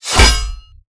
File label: Fight Damage Boost; mighty party 2017 gamerip pc ios android